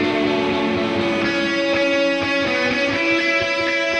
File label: accompaniment; musdb18